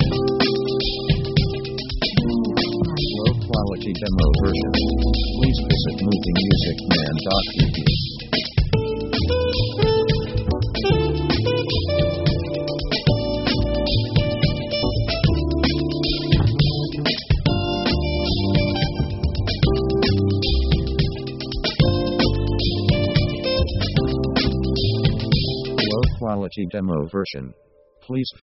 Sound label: jazz lounge samba; easy themes